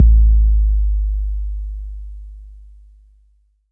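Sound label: bass drum 808 Tape Decay F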